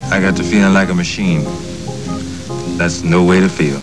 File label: MACHINE